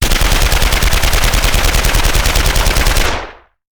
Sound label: Machine Gun; Sound Ideas; Guns